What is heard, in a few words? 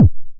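909 BD tape, kick drum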